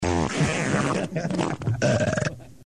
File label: birps farts